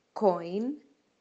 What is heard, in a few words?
coin